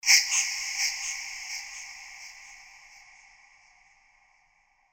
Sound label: musical haunting